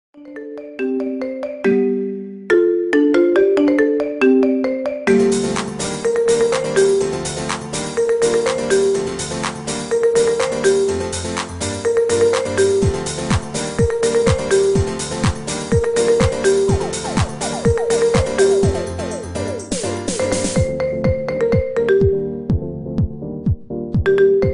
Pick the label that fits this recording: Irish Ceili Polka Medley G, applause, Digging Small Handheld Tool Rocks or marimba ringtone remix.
marimba ringtone remix